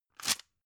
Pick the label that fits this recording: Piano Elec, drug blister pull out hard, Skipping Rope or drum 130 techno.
drug blister pull out hard